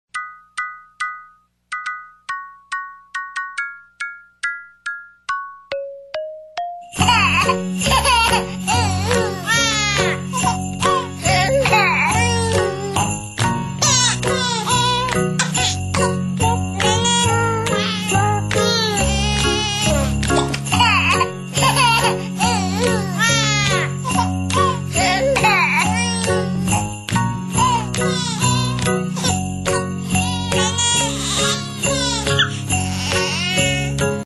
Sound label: jingle baby, Funny baby ringtones